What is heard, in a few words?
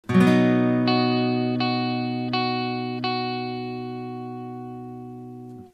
maj scale; fretjam